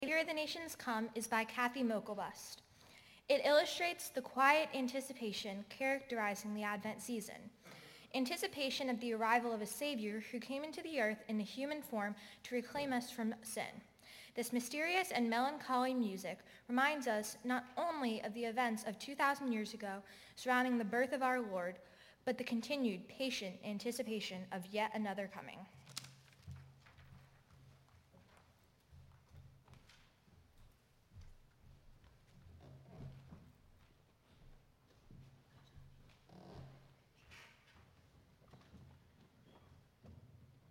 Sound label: Bells, bell choir; Saviour of the Nations, Come - Zion's Bell Choir